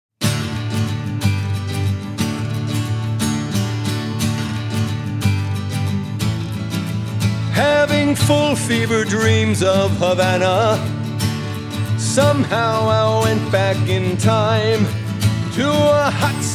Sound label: Guitar